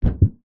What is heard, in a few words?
vehicles car bonnet hood pressure press hit bend footstep single